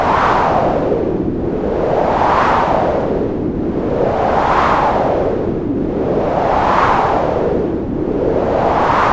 white noise through modulated low pass vcf, analog synth; analog synth 101